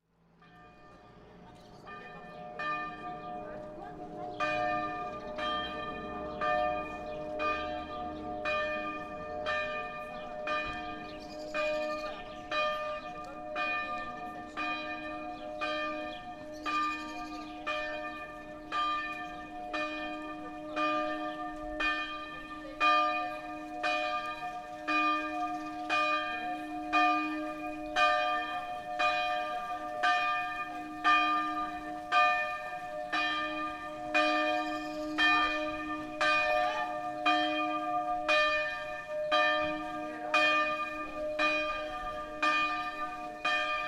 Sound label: Church Bells, Nernier Haute-Savoie France, 3D binaural